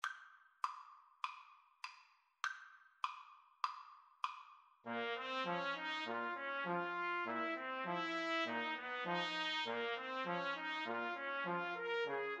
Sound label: 2 Trumpets Trombone; grieg mountain king